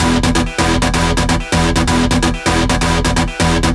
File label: Melody 128 BPM Root E; Vengeance Samples; Melody Kits